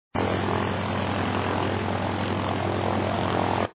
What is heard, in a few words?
Plane; doggler vs barron von hare